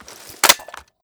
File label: bren reload end; emplacement